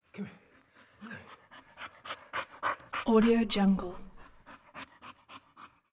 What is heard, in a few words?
dog snarling